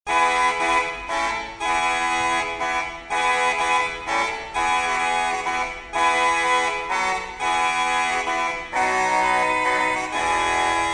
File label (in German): Regal 8 Trompete 8, Orgel